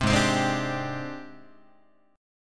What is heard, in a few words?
G sharp Major 7th Flat 5th Guitar; 3,4,3,6,6,6; JGuitar